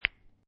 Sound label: Billiard Pool Snooker Two Pool Balls Clacking Together; Lynne Music